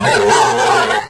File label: octabrain die; dn3d r